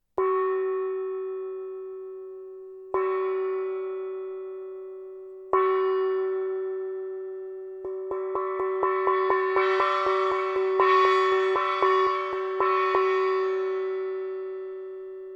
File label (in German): tischgong